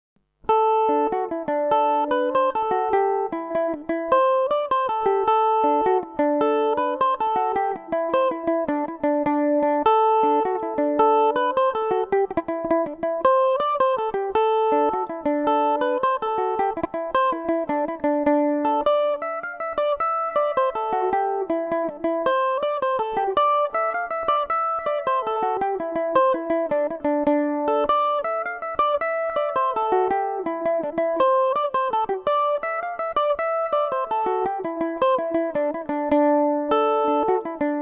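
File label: D mixolydian, irish mandolin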